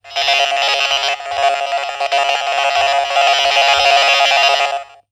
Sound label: Technology Electronic Noise